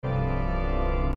favorite dissonant chord; Vaughan Williams Hyfrydol

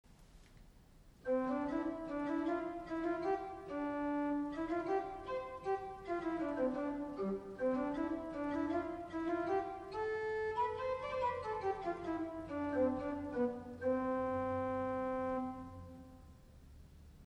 Tune Ionian

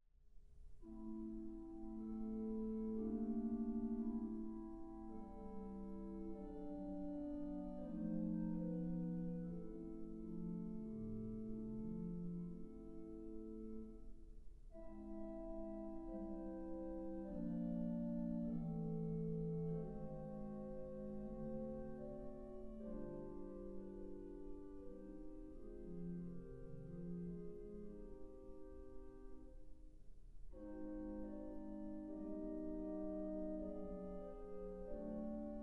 Franck Choral 3 tune with harmony

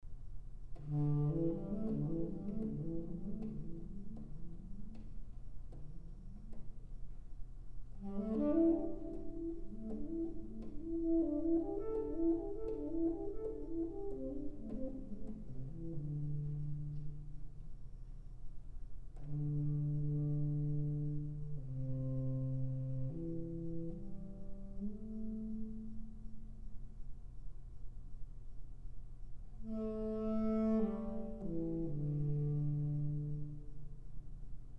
improv hymn What Wondrous Love Is This sax

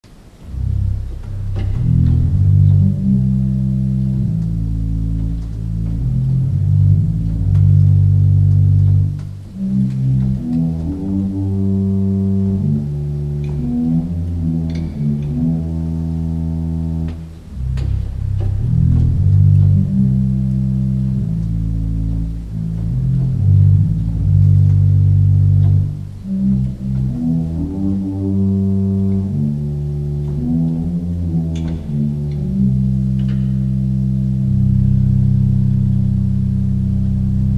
Organ Pedal Contrabass 16

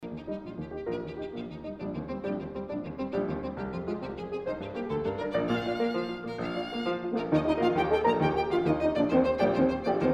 Brahms Horn Trio Allegro Con Brio

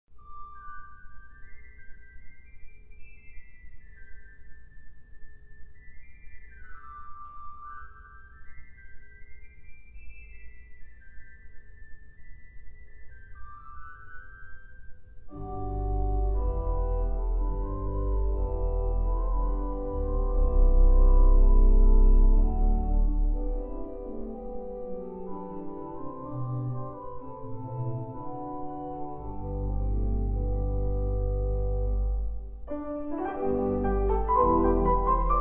improv Hymn duet, piano

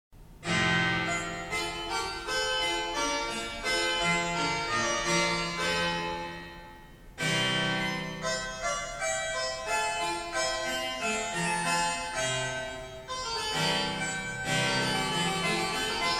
Bach Italian Concerto harpsichord

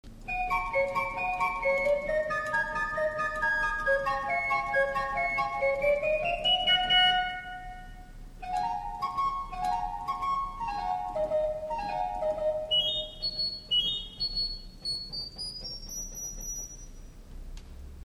Organ Positiv Sifflote

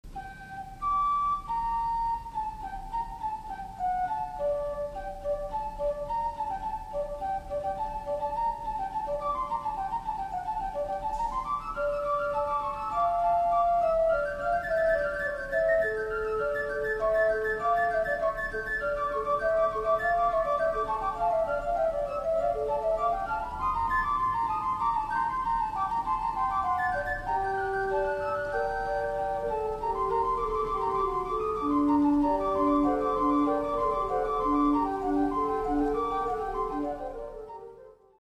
Organ Swell Flute Traverso 4

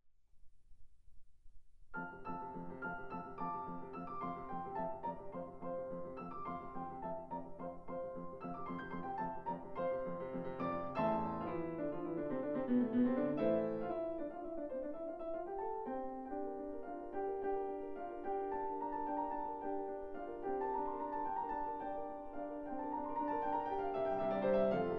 Piano Sonata in F molto allegro; Sibelius; Op12

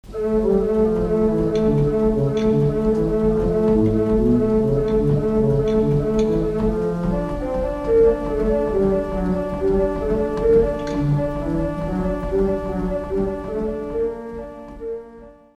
Organ Pedal Lieblich Gedeckt 16

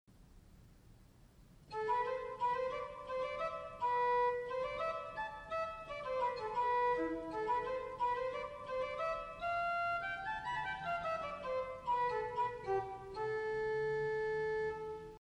Tune Aeolian

